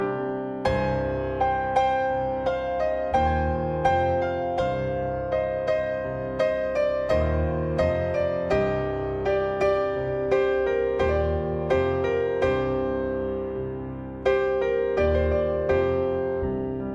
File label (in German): Schlaflied